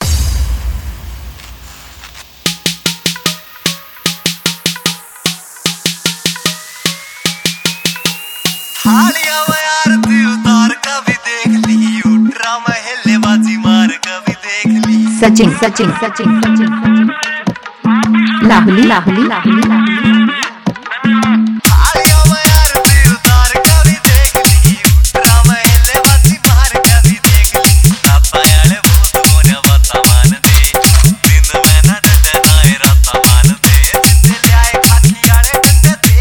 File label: Remix New Haryanvi Dj Song